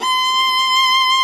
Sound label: C5 solo viola; InVision Interactive; Lightware VOL-1; Instruments & Percussions